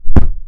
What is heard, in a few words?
Fireworks; QB64